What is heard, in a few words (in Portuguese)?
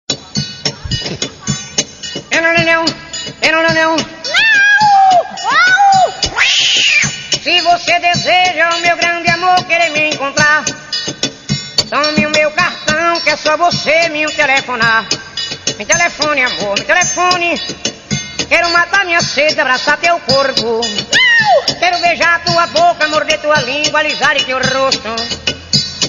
Forro